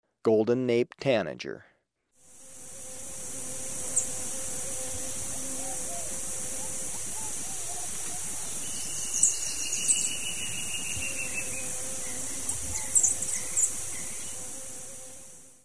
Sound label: Golden-naped Tanager, Birds of Ecuador; S. America